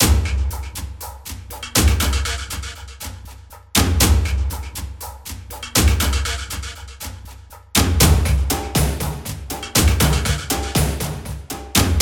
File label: Percussion Loop; Dual Blade Battle of the Female Ninja